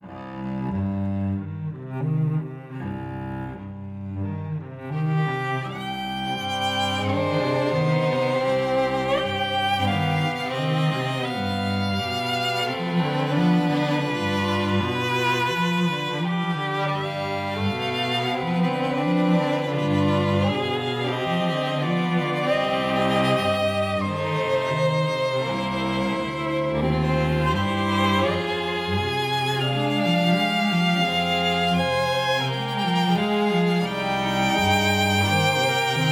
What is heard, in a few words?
The Spooky Quartet; Not for Broadcast